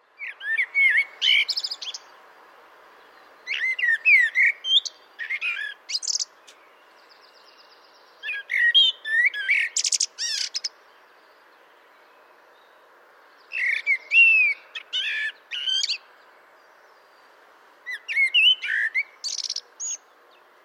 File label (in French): Merle noir